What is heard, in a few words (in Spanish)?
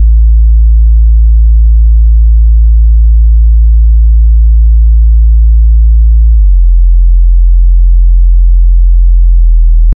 sonido de bass, instrument